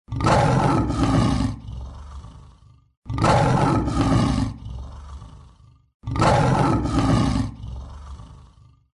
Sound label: tigers roaring sound; tigra